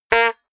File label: notification honk